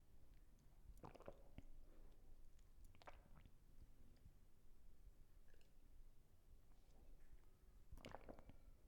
Gulping And Swallowing

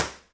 Ir Speakers Living Room

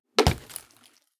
Bin Knocked Over

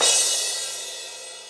Crash drum

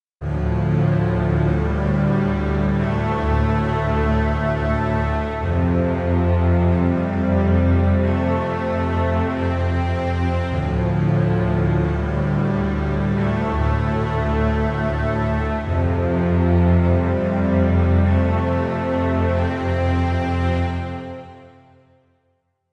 Bass And Cello

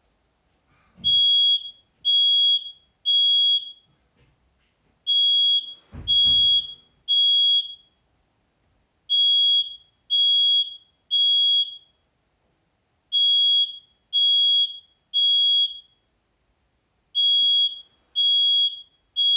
Smoke Alarm

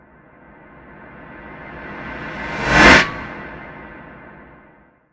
Spaceship Flyby Fast

